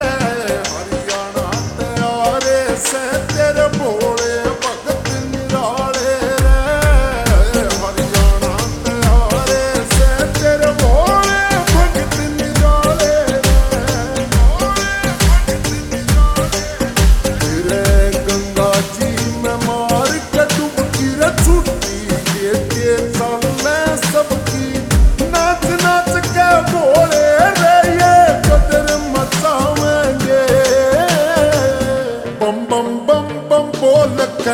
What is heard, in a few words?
Slow Reverb Version Ringtone